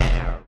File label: interface error, error tones; Multiple interface error tones at various pitches. Error, Interface Pitch, Tone Web Efx, Error